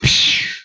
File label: Gun, retro arcade; Guns